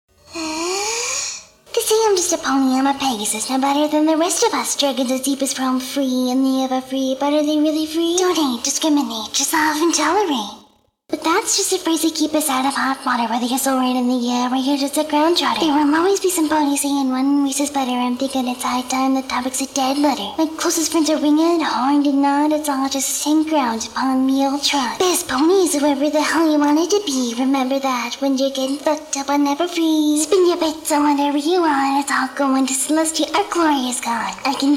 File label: ACAPELLA 102.4BPM